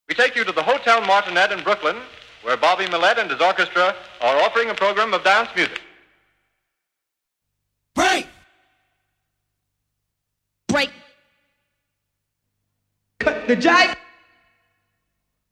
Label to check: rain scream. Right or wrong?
Wrong